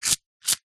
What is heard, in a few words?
leisure toy aerial disk launcher cord pull spin release